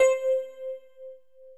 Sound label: dx piano